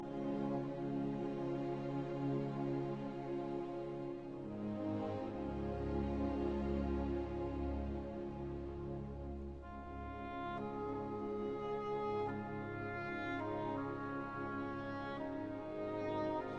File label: Symphony in E minor Largo